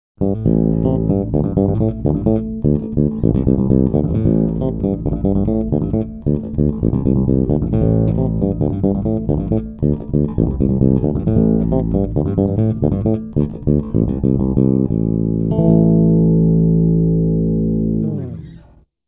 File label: JB bridge